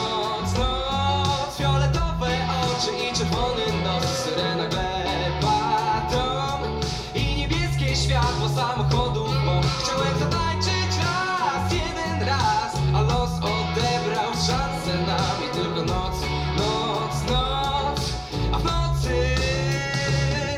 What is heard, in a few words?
rock